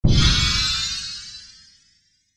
A Teleport Sound Effect; Science Fiction Sound Effects

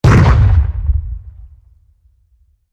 Explosion Blast Deep; Warfare; Bombs; BlastWave

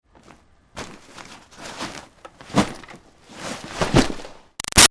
Foley Duffle Bag Sack Moves Drops; Objects; Big Room Sound

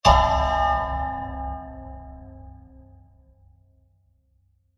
Scary Hollow Metal Impact; Blasts; Impacts; Crafted Signals

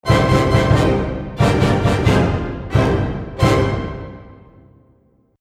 Dramatic Music Impact; Musical

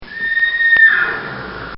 Horse Whinny; Bobby Cole

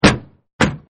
Car Trunk Close-2 Takes; Cars; Vehicles

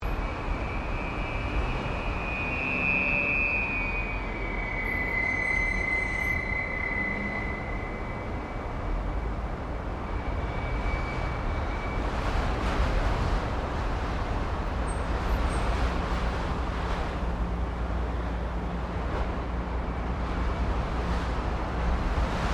Wind And Resonance In Apartment Building

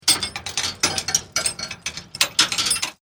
Chain Metal Clanging

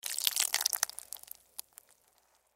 Horror Blood Spill Water Liquid Drips; Injuries; Combat; Blastwave

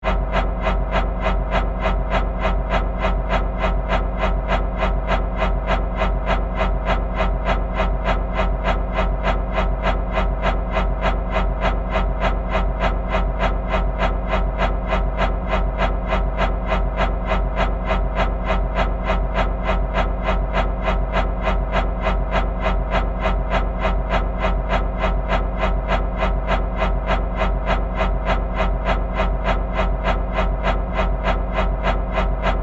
Fan Blade Rotate, Steady, Science Fiction; Spacecraft; BlastWave

